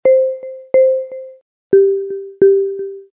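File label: Aircon Up Down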